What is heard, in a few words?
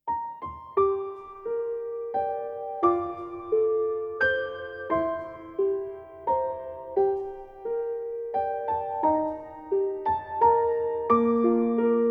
Slow Waltz